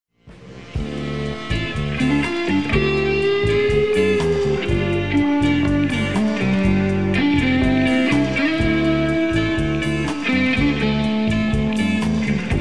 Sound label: Gtr Solo